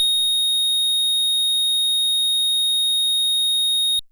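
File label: FM Sq Dist DX100 A#1; square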